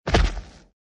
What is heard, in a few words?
land; Swordfisher